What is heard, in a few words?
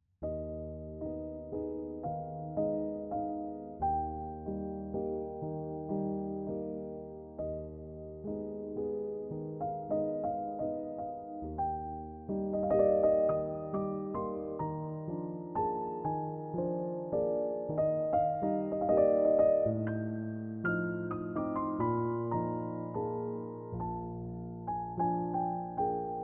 pianoteq bluethner dynamics